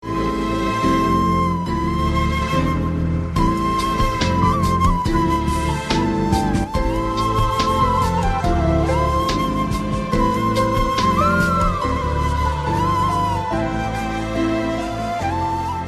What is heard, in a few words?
lovely flute music